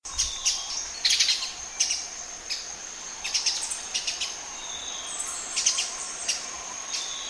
pijantung kalimantan

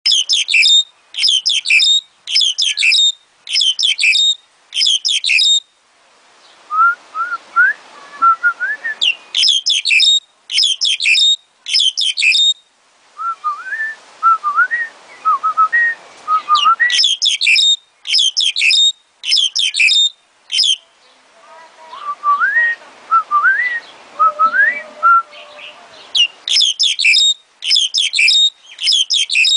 jalak hongkong

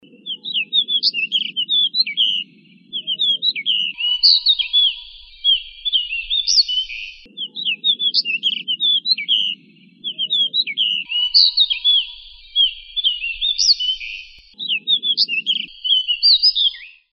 decu master kenari

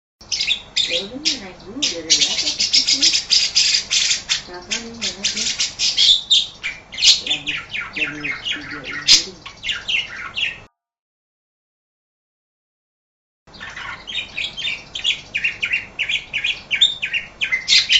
suara burung parkit

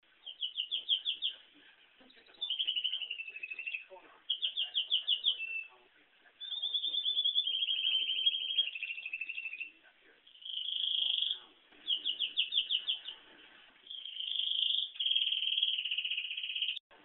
suara kenari cinnamon

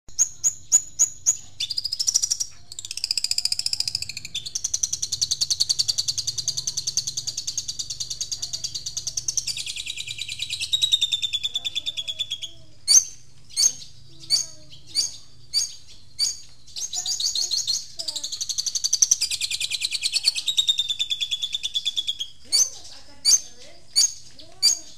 audio cendet super tembak

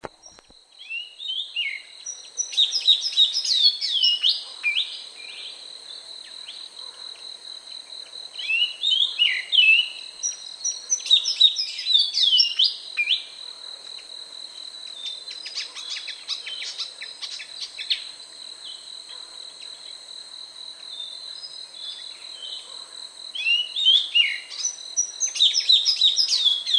suara sanma jantan saat mengajari anaknya berkicau

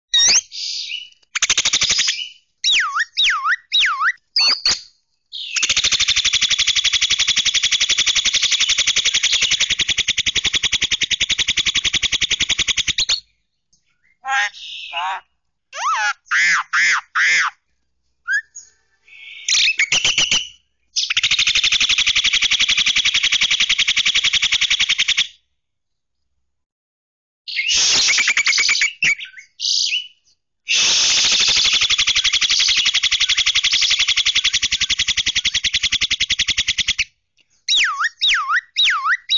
suara jalak kerbau

